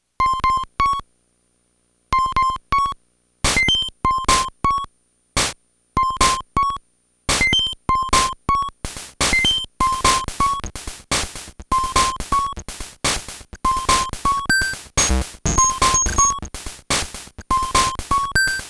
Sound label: boop boop beep